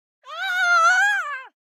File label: town human road; Blind Drive